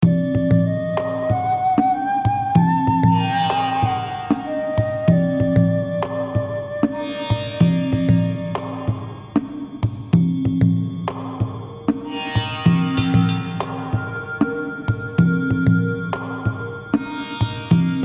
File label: melody; Book Of Lords